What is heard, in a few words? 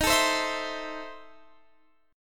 E Major 7th Suspended 4th Sharp 5th Eb